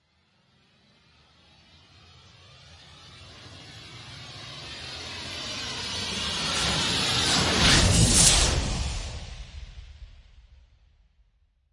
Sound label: Storms Meteor; Gothic Storm Music; Advanced Sound Design